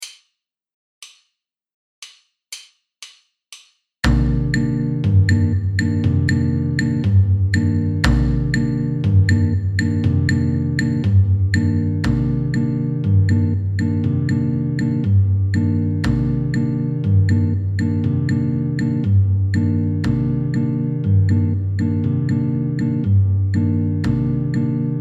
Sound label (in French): bb rythmique alt basse cm9